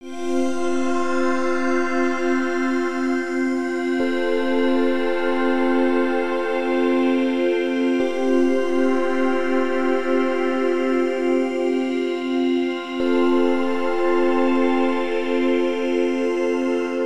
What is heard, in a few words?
Ableton Wavetable PAD; Frantasmagoria